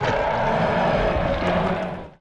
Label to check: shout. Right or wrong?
Right